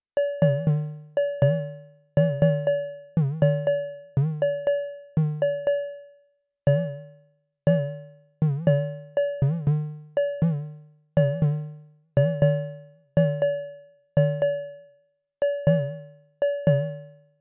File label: Duo Rhythm